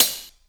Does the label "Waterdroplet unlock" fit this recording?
No